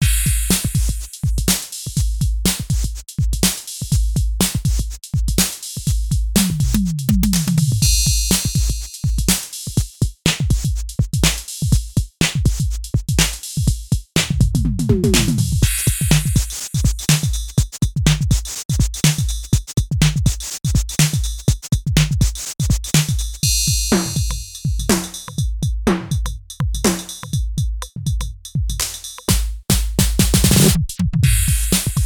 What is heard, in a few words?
Virtual Analog Drums; Kyma Classic; No Samples Used